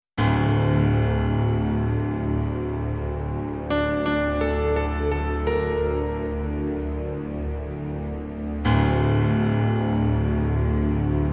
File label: underscore